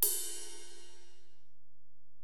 Ride, Alesis DM-PRO, Drum Machines

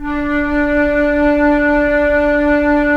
FLT FL, Flute; Roland - LA Composer vol. 4

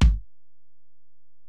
Ac BD; Alesis DM-PRO

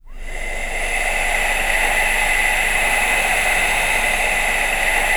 NOISEBED, VOCAL WIND; ILIO; Vocal Planet; Jazz & FX

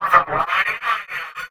VFX, VOX ALIEN; Best Service ProSamples vol.49 - FX-Area; AKAI

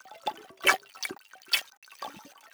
RITHM D O R, RYTHME; USB Soundscan vol.33; AKAI; Disjointed Textures

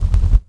bass, 150 BPM; Ueberschall; Drum & Bass Resonance

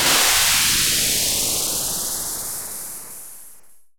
sfx sci fi; Masterbits - Switch FX

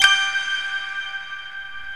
STEEL DRUMS

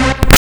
BIP, RAVIN HITS; Spectrasonic Distorted Reality 1

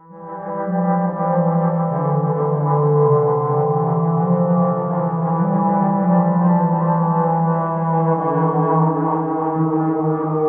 Spooky Pipe E2; Zero-G - Ghost In The Machine

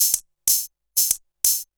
124bpm, House Perc Loops; E Lab; XTc Files of House

